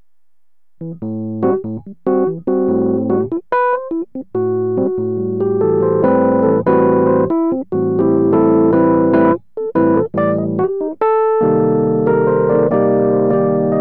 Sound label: Stretch Tuned Tine Bomb